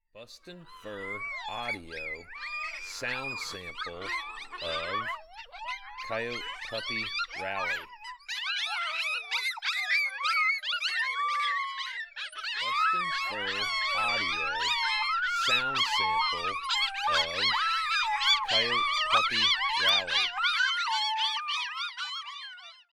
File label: Coyote Puppy Rally